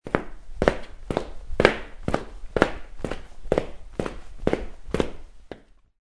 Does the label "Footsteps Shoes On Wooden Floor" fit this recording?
Yes